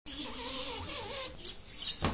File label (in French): texture zip